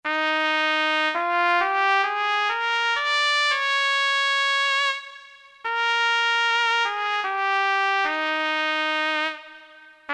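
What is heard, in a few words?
FLUGELHORN, Korg DSS-1, Brass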